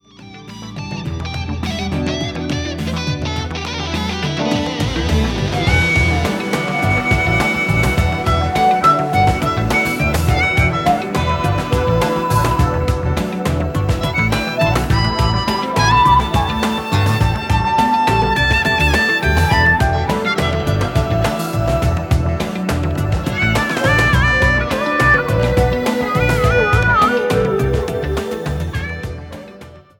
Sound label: Space Blues